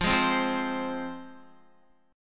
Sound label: F Minor, down, Guitar; strummed; JGuitar